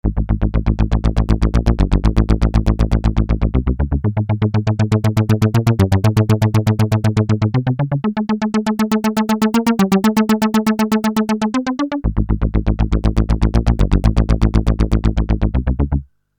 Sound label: synth